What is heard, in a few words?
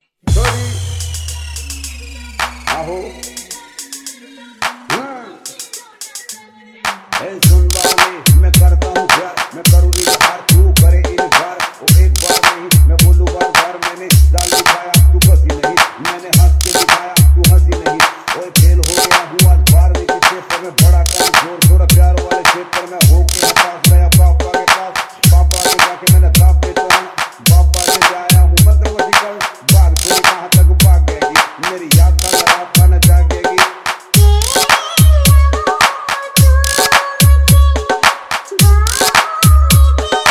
Rap Song Dj Remix Songs Hard Vibration Mix